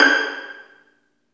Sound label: Vlns Pizz; Roland L-CD702; Vls Pizz